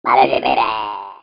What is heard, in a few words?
goblin